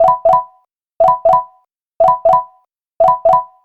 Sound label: Alarm; GPSMAP H1; Proximity Alarms